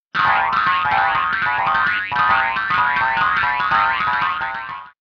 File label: Bouncy